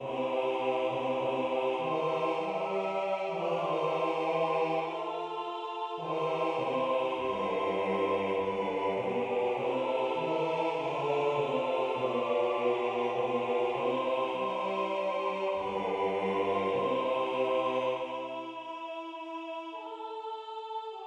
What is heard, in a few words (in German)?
Hebe deine Augen auf Bass